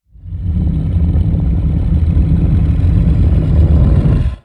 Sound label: trex growl